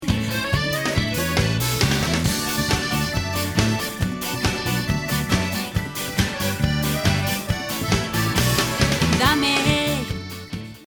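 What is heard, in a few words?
accordion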